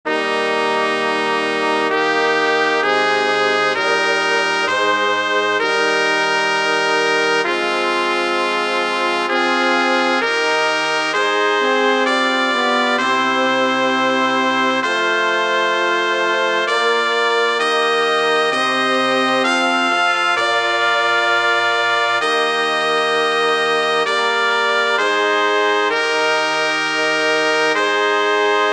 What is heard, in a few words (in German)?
Missa Sanctus Sopran; Kaim